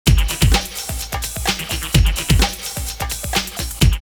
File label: Loop 128, drumloops Techno House; Plonky Loop; X-Static Goldmine